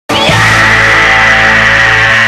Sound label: who scream